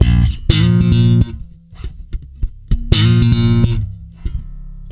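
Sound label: A#, funk swing, bass; Spectrasonics; Bass Legends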